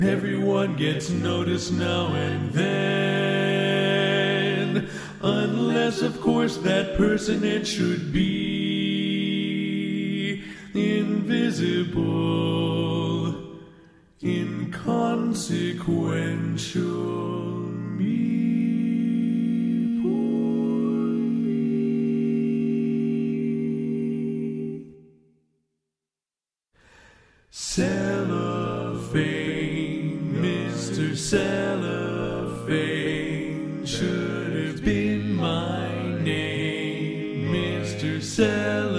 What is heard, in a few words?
Lead Left